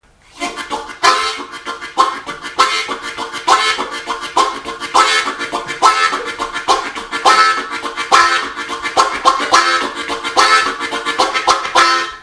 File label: Railroad, harmonica